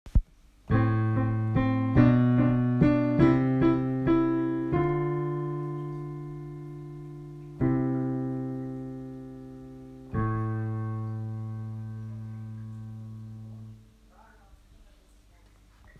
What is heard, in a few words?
piano